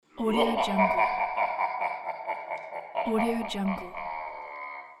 evil witchmaster laughing

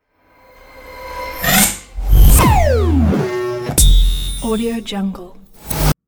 modern electronic logo

